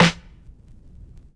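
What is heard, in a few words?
Vinyl Snare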